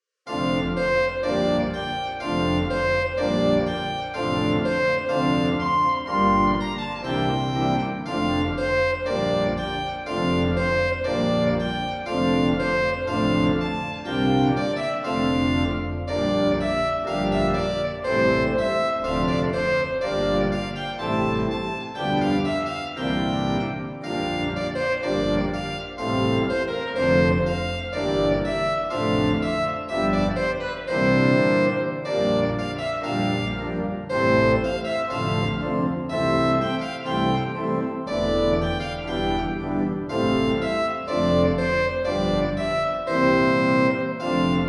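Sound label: fanfare alla rondo, organist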